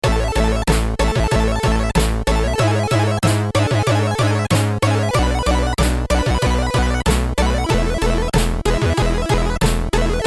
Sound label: with vocals